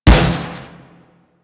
Impact Metal Heavy, Weapons; Warframe; Furax

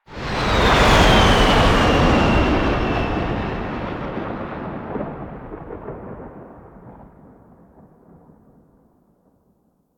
Plane Fly By; Warframe; Quests; Nineteen Ninety Nine

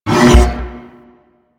Double Spin Door Open; Orokin

